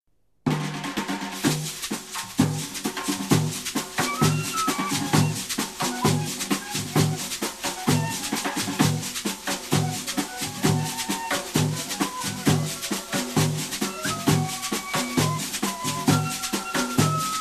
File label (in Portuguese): Toques com todos os instrumentos